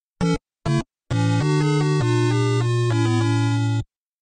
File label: game over noise